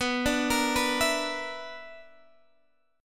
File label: B-Minor Major 7th Double Flat 5th B down Guitar Half Step Down; BmM7bb5